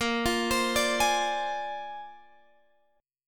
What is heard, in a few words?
Bb 9th Bb down Guitar Open G